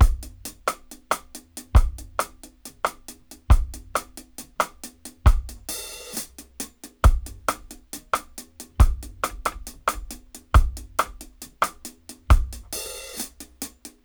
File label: DRY, DRUM LOOPS, 068 BPM, Dub; Drumdrops In Dub VOL-1